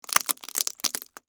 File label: Crunch Pasta Shells